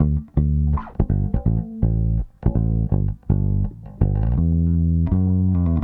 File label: Soul Bass, 70s Analog Funk; Sonic Foundry (Sony Creative Software); What it Is!